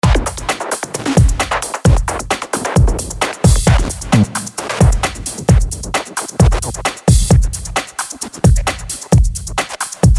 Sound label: Random Perc Generator; Vengeance Sound Avenger 2; Generative 1